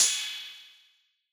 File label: Analog Cymbal; Yamaha DJX-2B